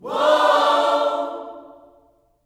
WHOA OHS, Vocal Planet Gospel; Vocal Planet Vol1 Gospel

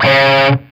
D 4 SOLDANO, Guitars, ROCK; Spectrasonics; Hans Zimmer Guitars VOL-1

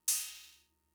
Cymbal; Fricke MFB-522

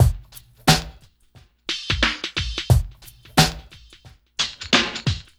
SWT BREAKB, SWINGTOMS; Spectrasonics; Liquid Grooves 1 & 2

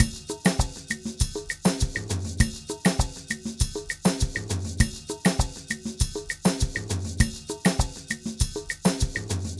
Ensemble, Latin Percussion

